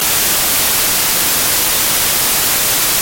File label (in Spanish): ruido blanco